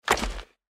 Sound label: result gold mini game